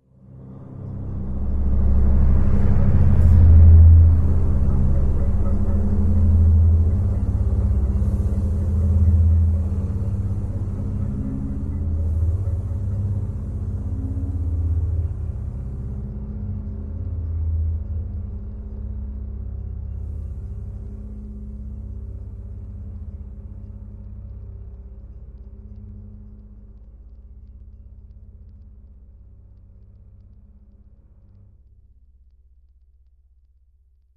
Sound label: Linear Tense; the walking dead season one